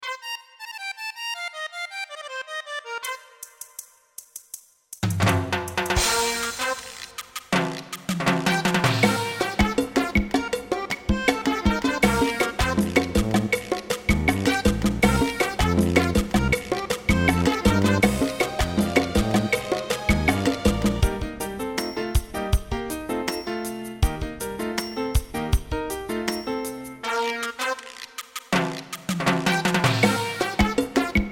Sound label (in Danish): Salsahorn